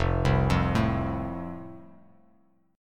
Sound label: F Major, F Chord; arpeggiated